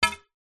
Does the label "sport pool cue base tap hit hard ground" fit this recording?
Yes